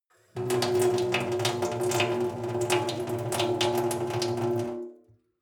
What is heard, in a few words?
sink water flow soft drip; industrie-wirtschaft; gastronomie